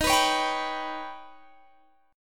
Eb Augmented 7th, Guitar Standard; strummed; x,18,21,18,20,19

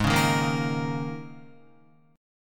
Ab Suspended 2nd Suspended 4th, down, Guitar; 4F,4A,5C,5G,5C,6E; strummed; JGuitar

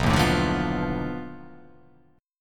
B 7th Sharp 9th Flat 5th, down

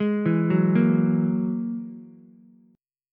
G sharp 7th Suspended 2nd Sharp 5th, Ukulele; G#7sus2#5; arpeggiated; JGuitar

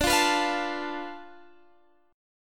D-Minor 6th, D, Guitar, Standard; Dm6 strummed; JGuitar

